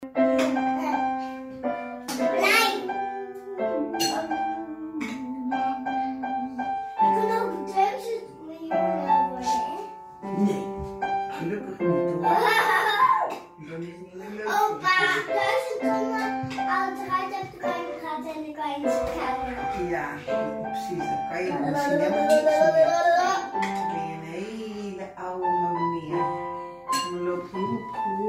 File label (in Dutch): improvisaties liedje